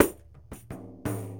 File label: Sm Frame, World Percussion